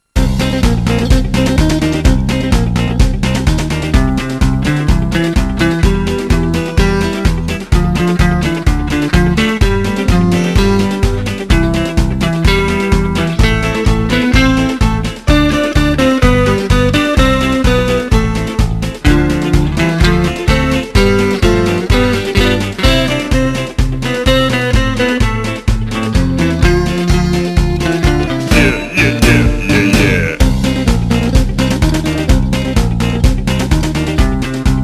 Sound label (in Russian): Мелодия; MUZYKALNYEIGRY